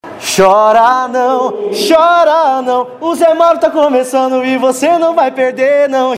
vinheta cantada

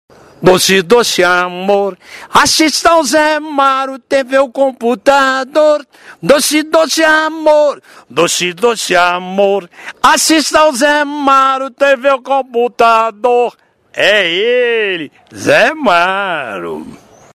vinheta cantada

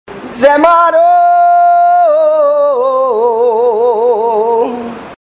vinheta cantada

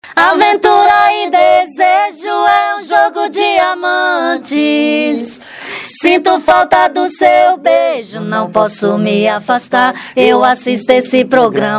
Vinheta Cantada

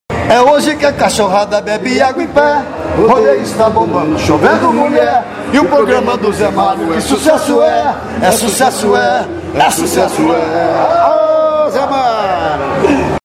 VINHETA CANTADA

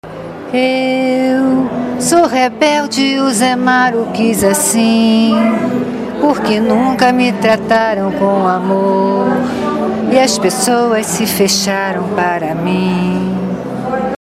vinheta cantada

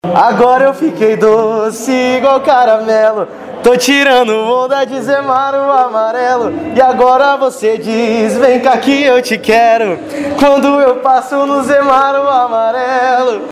vinheta cantada

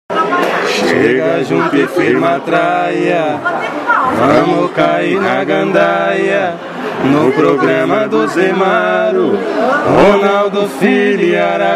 vinhta cantada

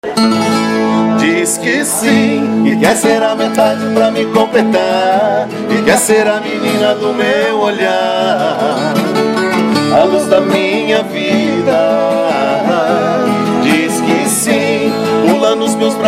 Vinheta Cantada

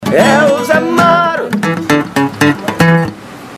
Vinheta Cantada; ZMARO

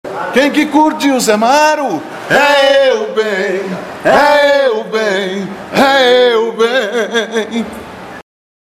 VINHETA CANTADA

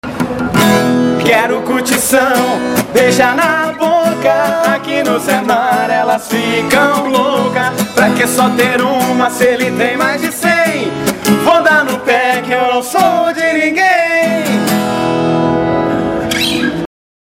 vinheta cantada

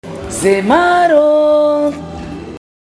Vinheta curta

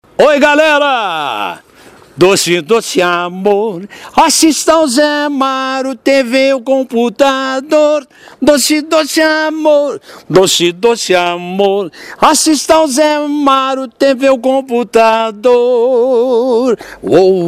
vinheta cantada normal

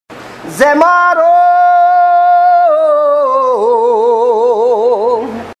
vinheta cantada

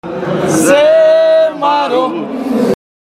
vinheta cantada

